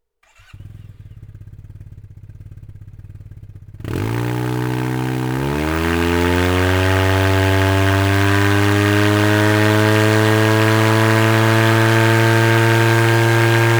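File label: RACING LINE TITANIUM YAMAHA TMAX, exhaust; akrapovic; 2019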